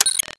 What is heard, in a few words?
beep large; Quake 4 Alpha